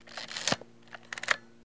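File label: 35mm Camera Manual Wind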